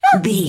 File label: voice fun small character emote scared